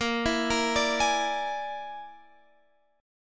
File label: Bb 7th Flat 5th Bb, Guitar Drop D; Bb7b5 arpeggiated; x,13,14,15,15,16